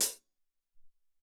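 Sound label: Closed Hat, Alesis SR-18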